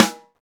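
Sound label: Snare, Roland; Real Snrs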